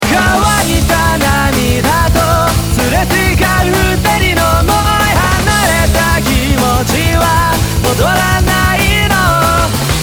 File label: vocal hamori pitch